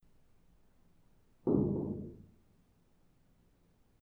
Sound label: golf